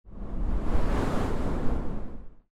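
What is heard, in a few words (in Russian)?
порыв легкого ветра; Звук ветра; Звук ветра для перехода в видео или для монтажного перехода в аудио монтаже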